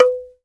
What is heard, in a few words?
Bamboo B2; Shinachiku; CMI